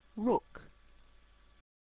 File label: rook